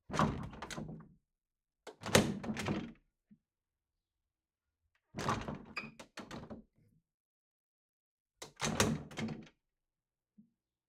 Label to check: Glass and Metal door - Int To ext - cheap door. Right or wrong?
Right